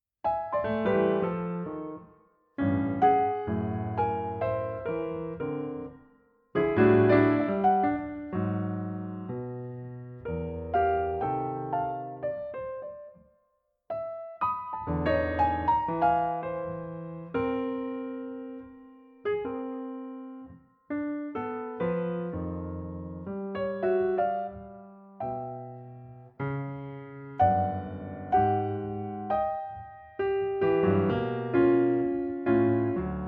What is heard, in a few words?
preludes